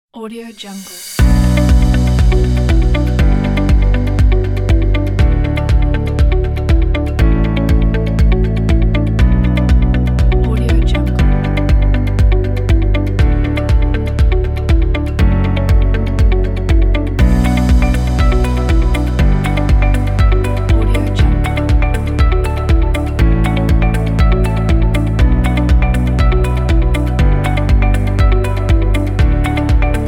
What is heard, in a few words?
corporate music